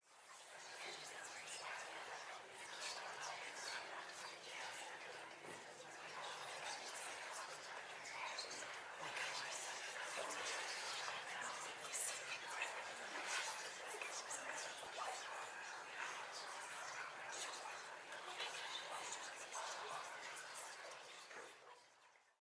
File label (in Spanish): Gente susurrando efecto de sonido